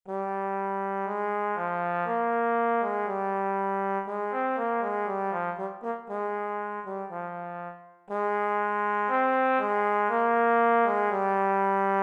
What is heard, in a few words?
trombone etude